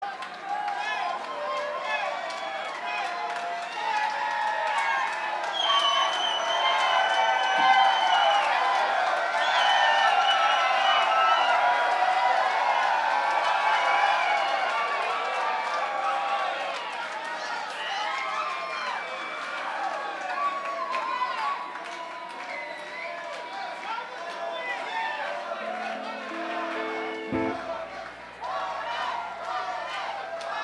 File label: Crowd Banter